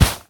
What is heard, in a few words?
Carpet; devour